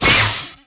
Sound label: k shield hit; MOTS